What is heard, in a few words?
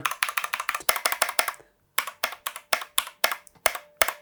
Corsair K65 RGB MINI 60 Keyboard Key Sound